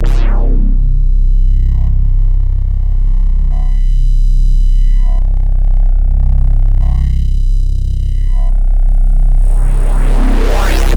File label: Cream Bass; AMG - Prototype Drum & Bass